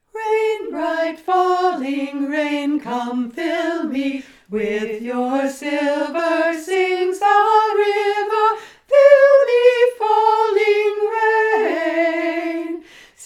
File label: Rain Bright Falling Rain remix